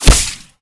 impact Killshot; Weapon Package FortWars

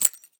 grenade Pin; Weapon Package FortWars

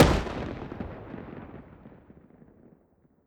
grenade Detonate Dist; Weapon Package FortWars

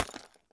grenade Bounce; Weapon Package FortWars

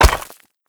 bullet Impact; Weapon Package FortWars

